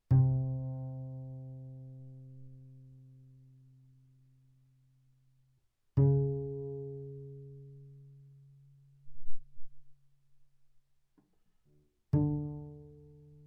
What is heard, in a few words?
Bass pizz sul G ff C3 Bb3, double bass